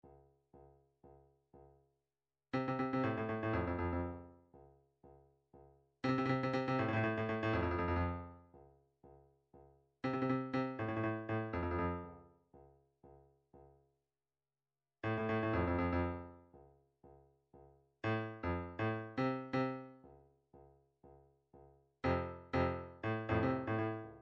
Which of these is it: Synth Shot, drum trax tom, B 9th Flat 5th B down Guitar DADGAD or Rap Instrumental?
drum trax tom